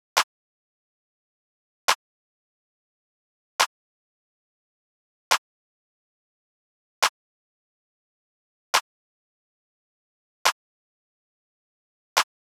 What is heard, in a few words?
Drum Loop - 140 BPM Clap; Loop Stems; Cymatics; DOPE DRUMS VOL. 1; Pluto